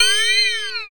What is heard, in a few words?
ice beast